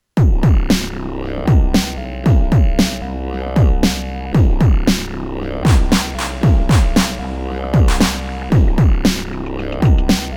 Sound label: Isla 2400